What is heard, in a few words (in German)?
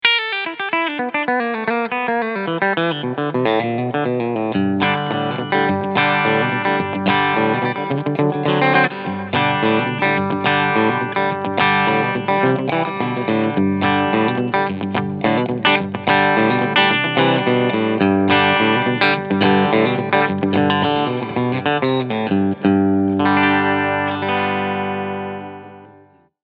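FLATV1 BK Cleansound Steg Pickup; Ibanez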